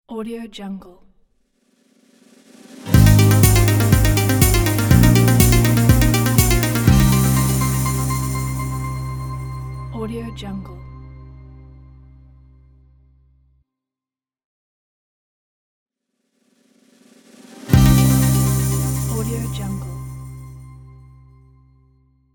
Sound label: news logo